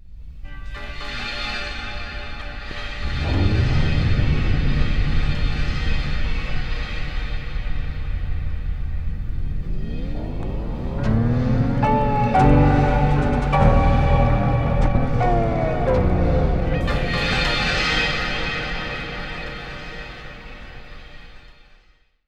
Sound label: Pub Drunk, D3; Zero-G - Ghost In The Machine